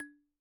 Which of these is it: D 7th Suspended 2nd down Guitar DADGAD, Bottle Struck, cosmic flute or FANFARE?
Bottle Struck